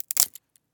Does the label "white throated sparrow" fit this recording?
No